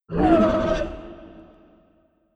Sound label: roar raptor